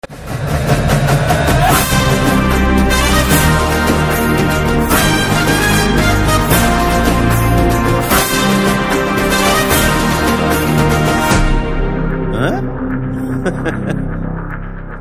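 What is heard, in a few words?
Teaser background music Telugu Ringtone; Krack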